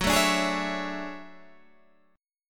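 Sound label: D Major Sharp 11th G flat, chord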